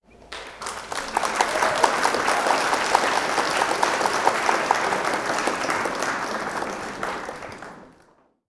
conference audience clapping strongly; mixkit

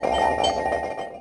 dragon rumble

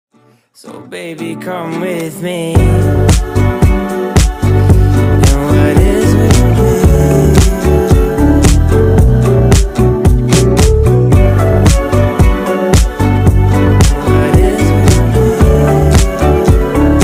music

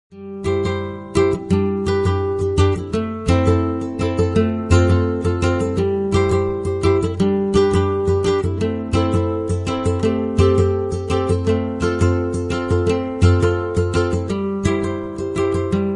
UKULELE Instrumental

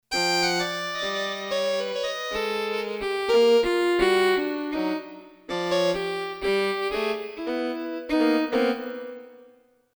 Violin

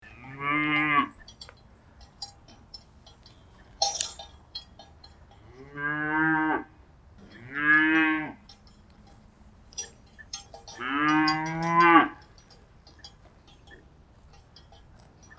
cows on pasture animal sound

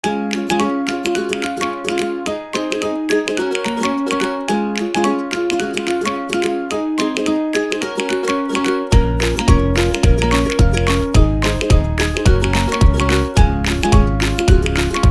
Background music for cooking videos; cooking background music food music background

